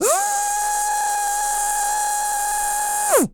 DRILL; Spectrasonics Vocal Planet; Jazz FX

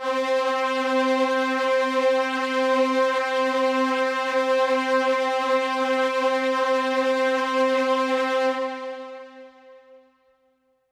Pad C, Synth Prophet One Shots; Electronisounds

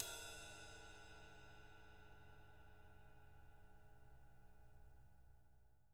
JZ RIDES, Living Drums; Sampleheads; Peter Erskine

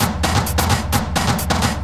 drum 130 perc, drumloops; Techno Trance Essentials

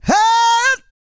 E YELL, MALE HUMAN; ILIO; Vocal Planet

